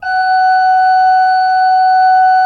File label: RFRP F4, Cathedral Organ, ROHRFLOETE; Propeller Island